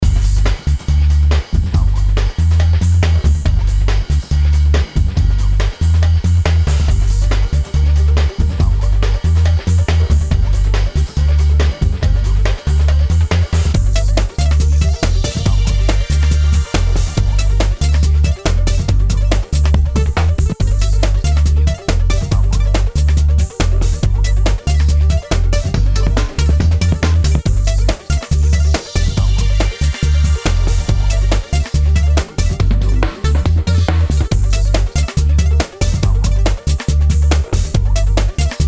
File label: electronica dancey